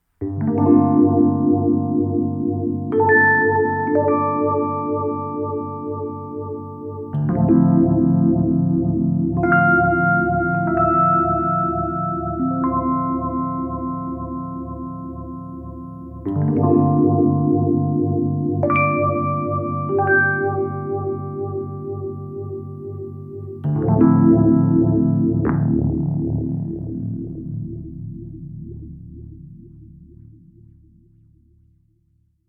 Fender Rhodes; EQD

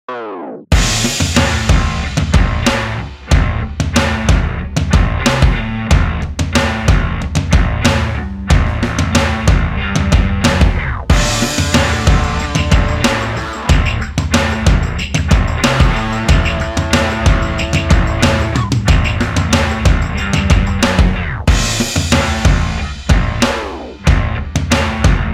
BIG ROOM DRUM SAMPLES

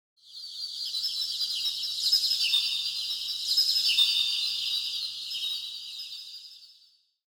stereo birds

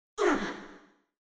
Princess KnockBack VOX

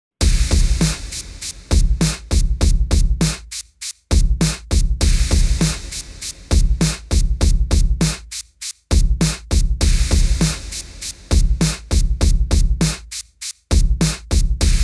Drums

